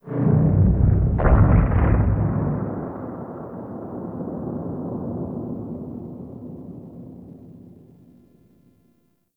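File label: Distant Seismic Explosion, Exterior, Gas City; Warframe; Corpus Gas City; Ambience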